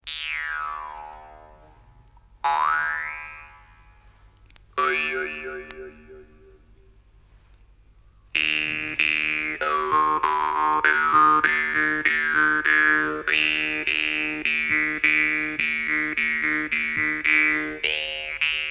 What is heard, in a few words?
Evenki Eveny Negidaltsy Jews harp; kordaun кордаун